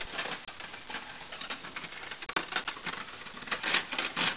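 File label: silverware; 12000 Graphics Pack - A Complete Graphics Collection For Your PC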